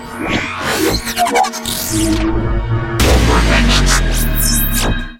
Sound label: Transform Sound; Transformers; custom tesla lock sounds and chimes